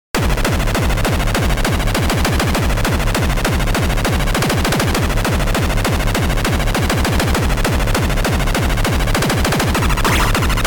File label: pew pew raygun; weeklybeats 2026